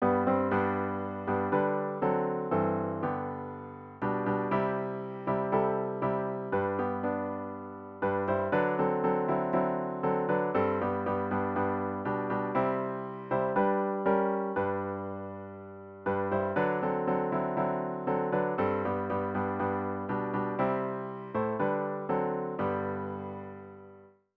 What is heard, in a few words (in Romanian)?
TU EȘTI PRUNCUL CEL MĂREȚ midi